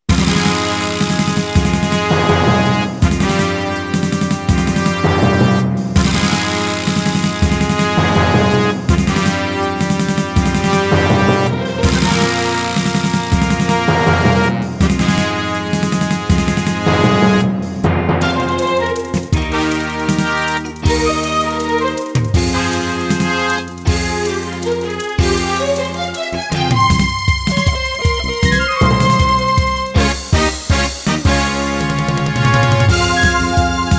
Synthesized Version; Dragon Ball Z: Ultimate Tenkaichi; PS3; Xbox 360; Homunkurusu Machi e…

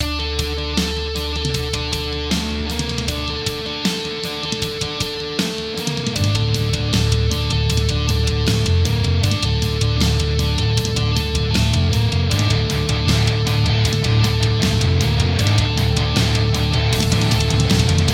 Splash Screen theme Loop; Super Mega Baseball Extra Innings

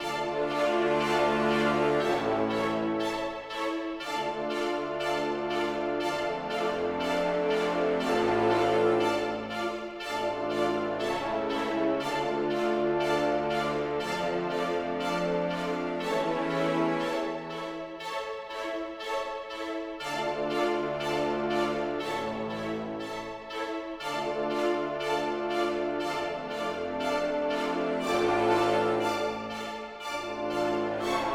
Sad High Short Strings And Brass

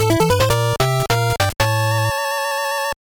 Boss Clear; Robo Warrior; NES

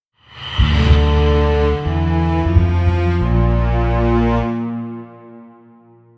Defeat

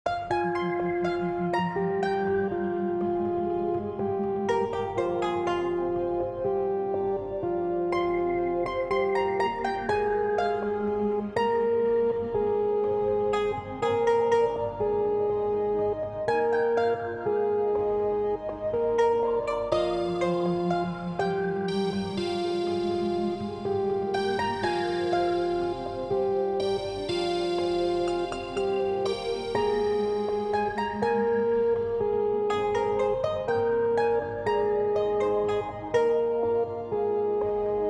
Macabre Funfair Koto Melody

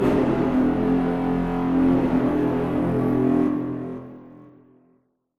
Music Stinger; HDF Ch2

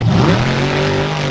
Final Rev, monster truck; Monster Truck Destruction; Android

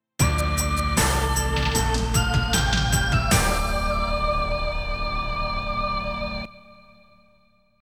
End (GAME OVER); mirai ninja g.s.m. namco 1

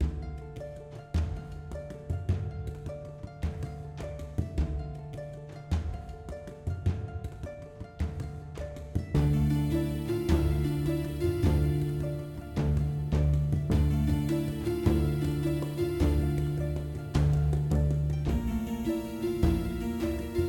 Tavern; Griftlands

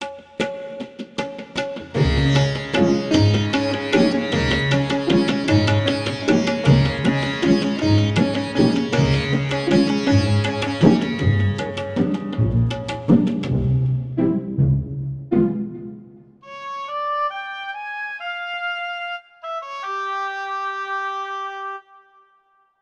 Tuba

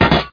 Metal Impact

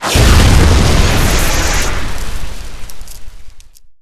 Weapons Shock Rifle

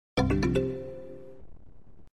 Item Found

